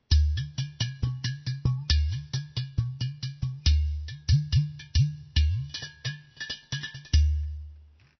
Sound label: udu